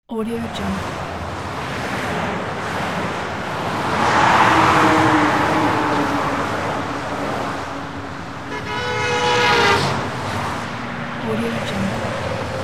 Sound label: highway